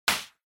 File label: Party Popper Close